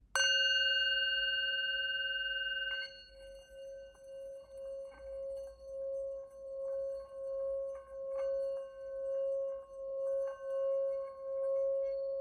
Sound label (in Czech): tibetska misa; Tepaná tibetská mísa Chuto o hmotnost 423 g, včetně paličky; Chuto malá